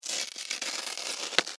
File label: Envelope Manila Tear Open Long; Paper; Offices